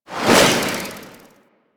Grenade Throw Whoosh; Enemies; Warframe; Infested; ZealoidPrelate

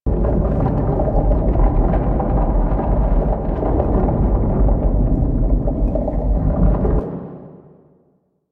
Limb Spawn Rumble Loop; Warframe; Quests; Entrati Labs

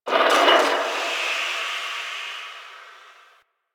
Landscape Construction Site One Off, Ambience

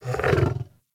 interact sleep sniff lay down, Kubrow; Enemies; Warframe; Tame

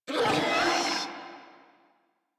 Infested Charger Death, Enemies; Warframe

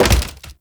Hammer Impact Wood; Weapon Impacts; Player; Environment; Tuvalkane Hammer

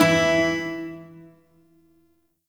Shawzin Oct One E; Warframe; Duviri Shawzin

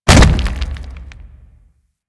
Golem Step Default; Warframe; Footsteps

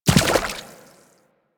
Inf Medium Land Water, Infested Footsteps; Warframe

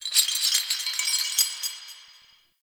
Chain Rattle Distant, Ambience; Champion Crypt

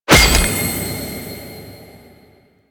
UI Socket Filled; DE; Warframe; Fusex Treasures

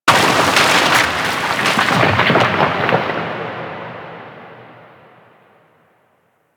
Rock Fall Large; Ambience; Warframe; Corpus Outpost

